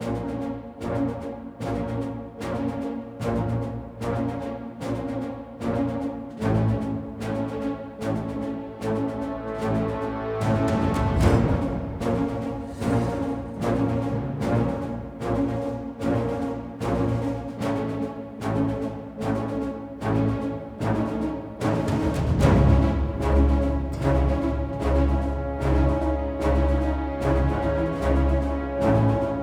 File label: Default Loading Music; Battlefield 1